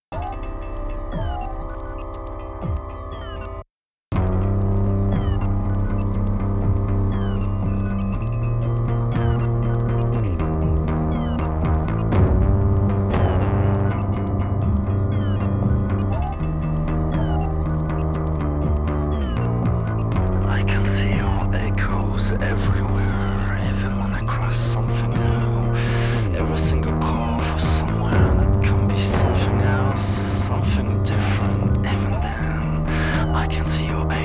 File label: echoes